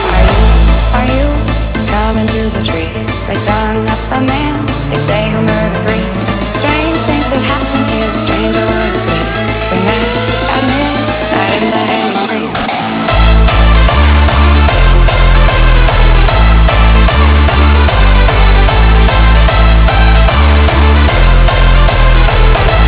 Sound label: music; jb community